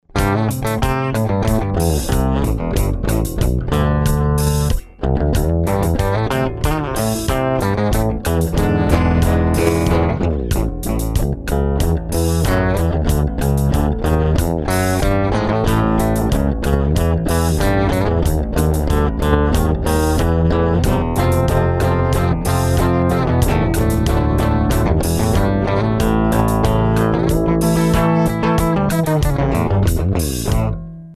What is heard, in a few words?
8 string active mode bridge pickup pick, Bass; ShamrayBass